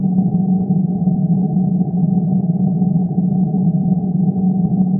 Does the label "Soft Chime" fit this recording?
No